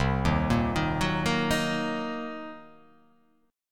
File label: C Minor 7th Flat 5th, chord